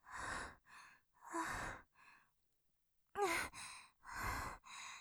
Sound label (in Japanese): 絶頂余韻 荒い息で・息を整えるように; ループで楽しむ！シェフの気まぐれ抜き抜きコース